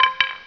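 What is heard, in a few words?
wood fall; 3dcyber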